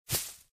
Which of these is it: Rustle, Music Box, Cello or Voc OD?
Rustle